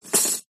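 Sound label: Silverware Drop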